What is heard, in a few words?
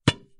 Bomb smack